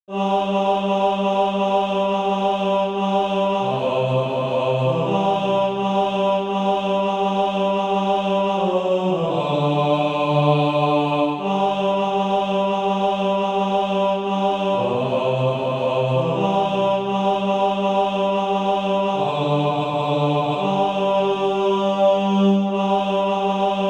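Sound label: bass, Sheet music (SATB) including Practise Music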